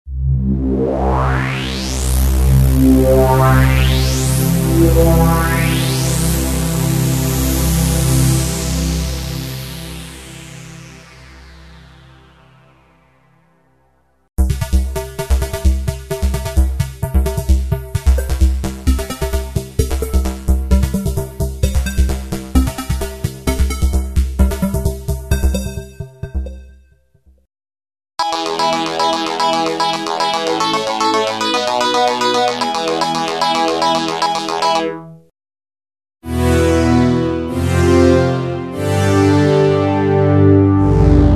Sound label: waldorf q